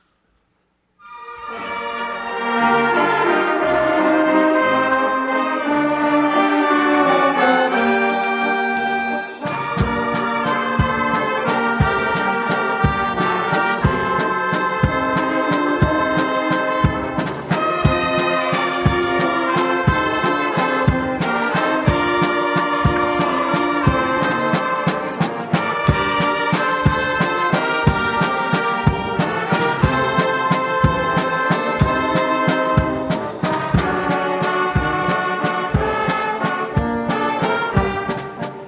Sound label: band Ukrainian Church; prazdnik trub 2005